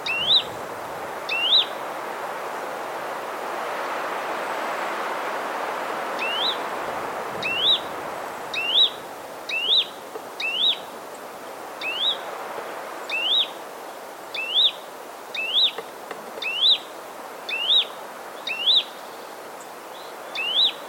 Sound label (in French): pouillot bonelli